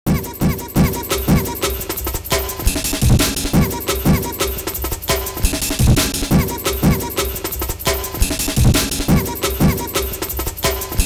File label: drums